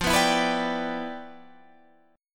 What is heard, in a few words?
G 6th F; G6/F strummed